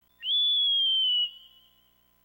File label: Bird Whistle